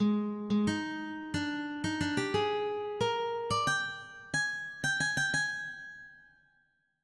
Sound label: guitar